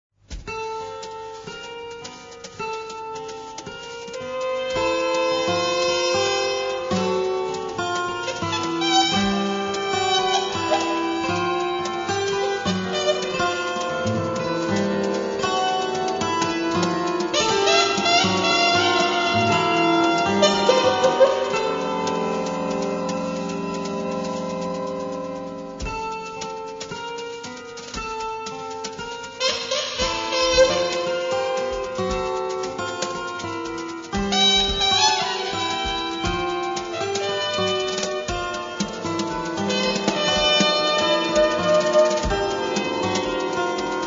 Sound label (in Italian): Tromba